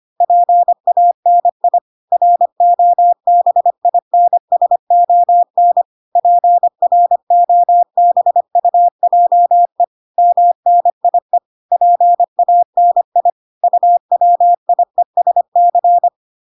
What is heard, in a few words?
25wpm